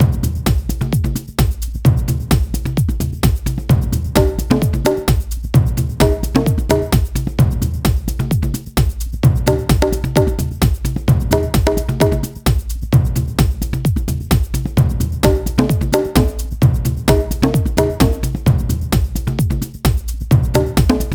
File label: BONGO130; Buzz! Junior Jungle Party; PS2